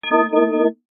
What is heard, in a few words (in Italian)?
ciottolie reson; acque